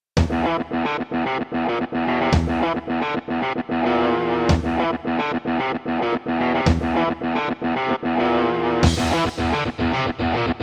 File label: Roland SD-90 GSMODE; Doom